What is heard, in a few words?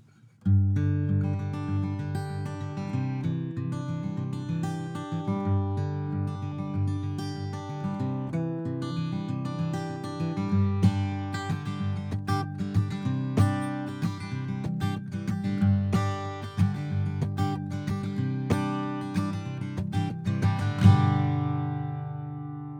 guit; ksm32